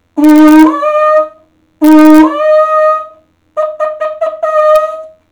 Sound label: gigas, horn